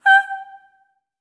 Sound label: STACC G5, Symphony of Voices, SOP STACC